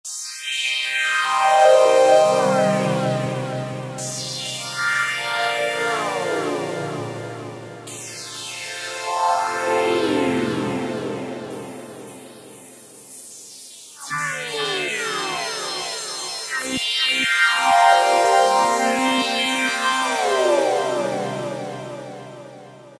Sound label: Lazy Pitch, Korg microKORG; Factory Voices